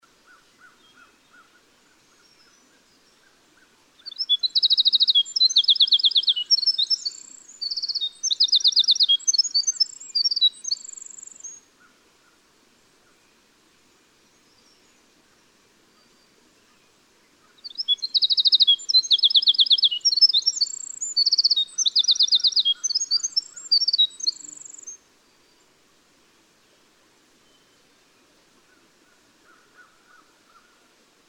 Winter Wren

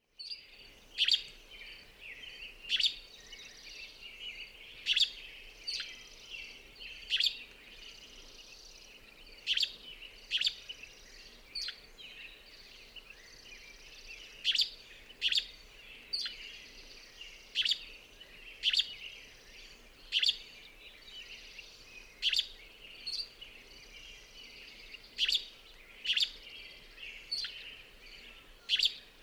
Gray Flycatcher

